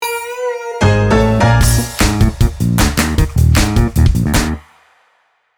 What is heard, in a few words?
Start Tag Sitar